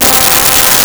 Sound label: Trombone C4; Nintendo; July 2020 Gigaleak